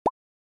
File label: pop sound